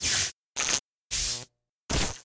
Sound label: Ambient Engine Shock; Among Us